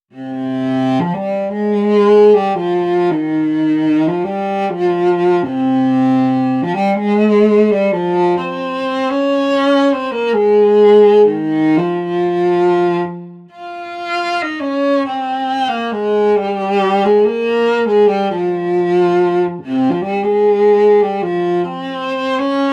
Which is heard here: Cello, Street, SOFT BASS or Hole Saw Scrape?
Cello